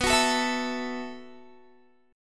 B 7th Suspended 4th B, Guitar; B7sus4; strummed

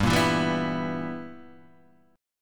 B Major 7th Suspended 4th Sharp 5th G

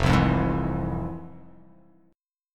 F Minor Major 7th, F

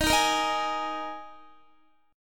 D sharp Suspended 4th, Guitar; strummed

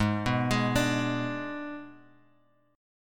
G sharp Diminished G sharp, Guitar Open G

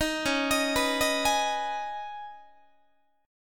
C sharp 7th Suspended 2nd C sharp down Guitar; C#7sus2; arpeggiated; JGuitar

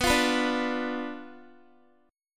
B Diminished B down Guitar; strummed; tuning 4F,4A,5C,5G,5C,6E

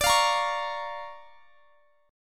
D Minor Major 7th Sharp 5th, D, down; DmM7#5; strummed

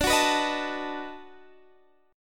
B 7th D; B7/D strummed; JGuitar

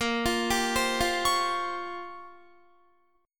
Bb Minor 6th Add 9th Bb down Guitar; Bbm6add9 arpeggiated

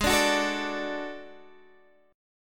D Major Ab, guitar; D/Ab strummed; JGuitar

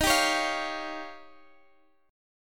Eb 9th Eb, Guitar Standard; strummed; chord

